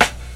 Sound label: warm sounding steel snare drum sample b key